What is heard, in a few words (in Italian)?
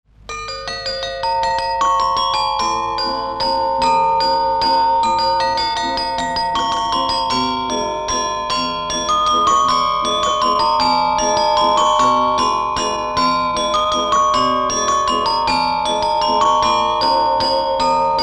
Valzer Vapore, campanari